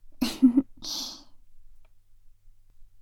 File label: laugh, ASMR; Garden; WithRin-Nazo01